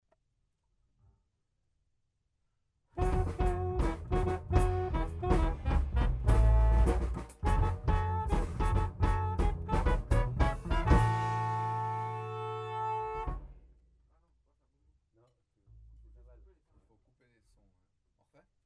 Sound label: riff unisson